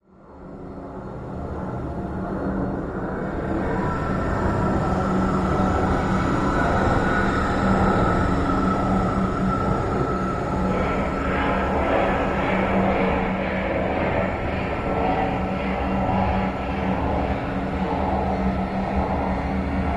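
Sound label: Metal Air Modulated Wind, metallic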